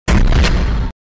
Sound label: hard hit